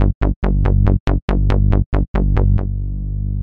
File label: Nu Skool Bass 140